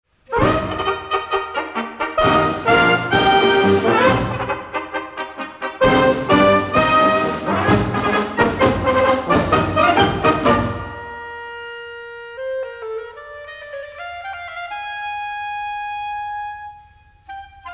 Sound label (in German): fanfarengruesse